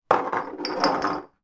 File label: food drink several empty beer bottles place down on bar